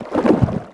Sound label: alligator swim slow